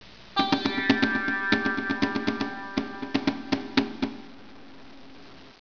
mideast

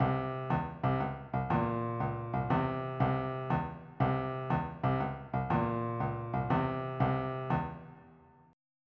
motown

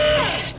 OPEN STOP, doors; shogo

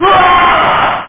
DEATH

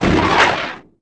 plan hit; pcdemo

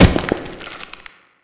marble d; bodyfalls; player; kingpin; actors

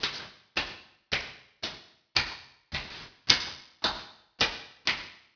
Foot Step

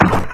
bow fire; Soldat

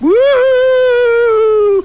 wooo; doom; homer

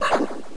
zap; dungeonmaster

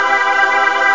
choir

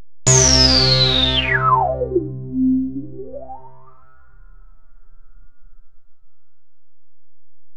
juno, Synth

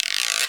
transform; ArTKanoid; Stones

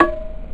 zoom; BrainHex 1.0

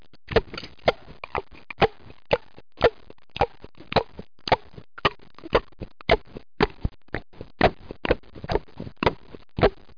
THROAT

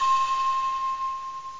Recorder; OctaMED SoundStudio V1; mirage

